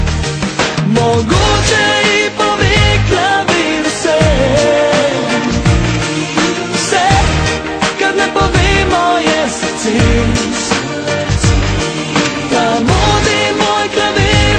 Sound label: klavir rok